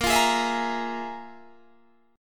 F Minor Major 7th Double Flat 5th

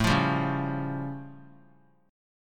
A Augmented 7th A; strummed; 21,24,22,23; JGuitar

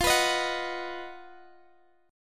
B Major F

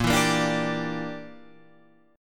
A sharp 6th Flat 5th chord; x,x,8,9,10,9,9,11; down

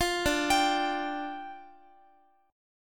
D Minor Double Flat 5th, down, Guitar

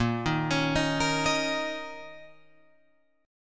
Bb Minor Double Flat 5th, Guitar, Open F; Bbmbb5; arpeggiated; 10,10,13,10,13,10; JGuitar

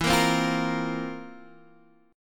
F Major 7th Suspended 4th E

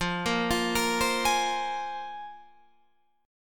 A sharp 7th Suspended 2nd F, guitar

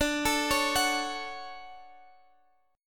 D-Major 7th D down Guitar Standard; DM7; arpeggiated; capo=2

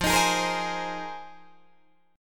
F Minor 11th, Guitar DADGAD

